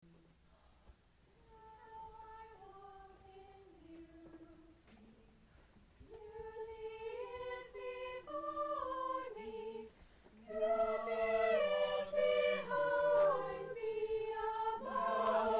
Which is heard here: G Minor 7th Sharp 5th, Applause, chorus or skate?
chorus